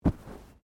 Bodymovement Fall Light

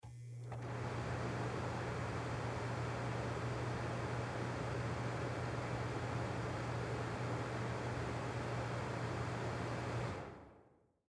Bathroom Vent Fan, Vent Fan On Run Off Short; Household

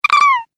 Cartoon Gremlin Silly; Cartoon Voice Sound Effects

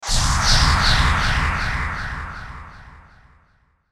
Whoosh Low Delay; Blastwave

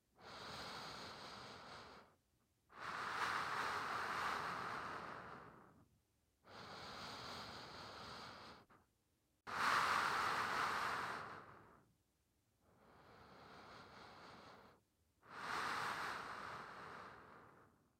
Human Breathe Extremely Slow Rubber Mask; Horror; Scary Breathing; Blastwave

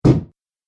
Body Slam Impact, wrestling and struggling

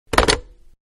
Telephone-Hang Up; Telephones (Sound Effects:Electronics)

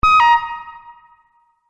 Multimedia Event Notification, beep sound effect

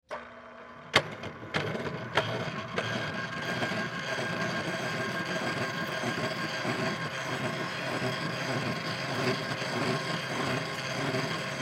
Drill Press Bit Drill Metal; Drills; Tools; Industry; Blastwave

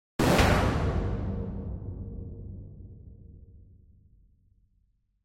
Hit Sweep In Metallic Dramatic; Trailer; LYN115 Trailer Production Elements - Hit 07

